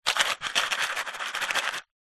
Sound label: household box card small safety pins shake lid off